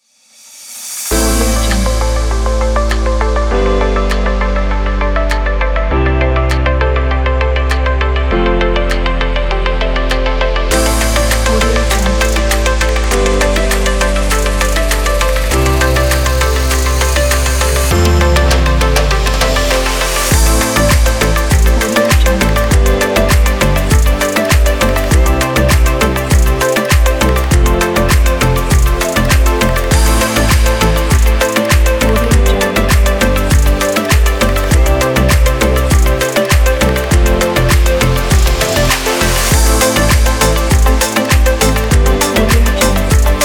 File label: promo in